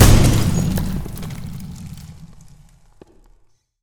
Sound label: glass impact; Damage; Barotrauma; Subsurface